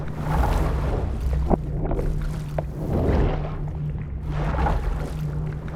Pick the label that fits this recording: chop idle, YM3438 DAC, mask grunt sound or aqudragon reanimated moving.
aqudragon reanimated moving